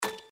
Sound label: Reel Stop; Thanksgiving Blast